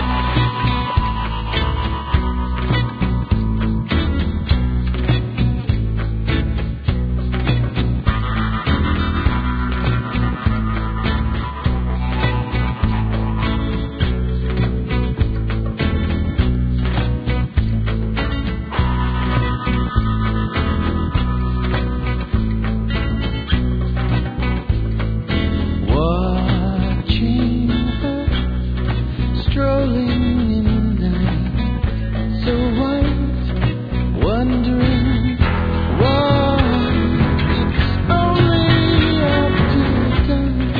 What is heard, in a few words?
tavern; Palm OS; EDGE